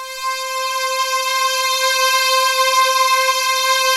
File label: GLASS VOX, ROLAND D-50; Rubber Chicken Software Sample Bank